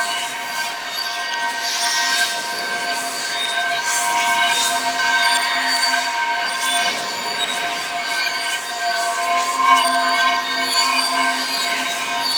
Shrine Loop; Warframe; Cetus; Cetus Invasion

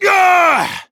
Exertion; Warframe; Entrati Lab Quest; 2023 TennoCon; Arthur

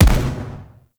Energy Rifle Split Explosion; Warframe; Venus; Venus Grenadier Spaceman Agent

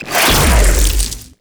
Beam Shot, Archwing Primary; Warframe; Larkspur Prime

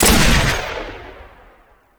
Mixdown Shot; Lex Prime; Trinity Prime Boss; Warframes

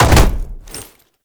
Land Heavy Default, movement; Warframe; Uriel

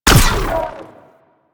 Space Fighter Laser Fire; Space Fighter Laser Agent

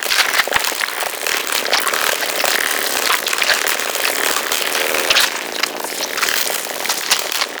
Shield Projectile Loop; Warframe; Warframes; Garuda

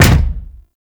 Sphere Projectile Bounce; Warframe; Warframes; Gyre

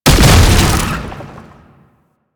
Land, Foley; Enemies; Warframe; Proto Infested; Babau

